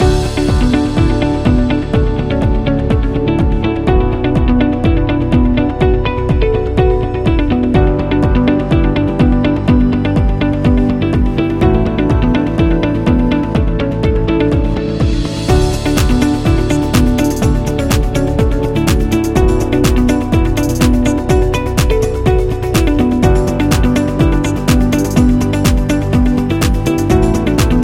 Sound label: Art Loop